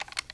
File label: equip draw; cstrike; valorant; neofrontier sheriff